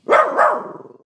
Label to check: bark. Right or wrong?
Right